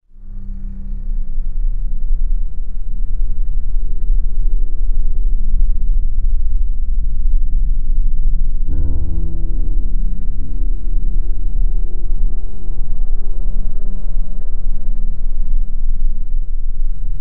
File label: Linear Tense; the walking dead season one